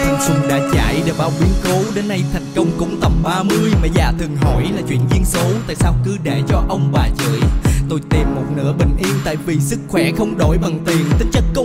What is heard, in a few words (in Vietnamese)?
Nhac Chuong (Rap)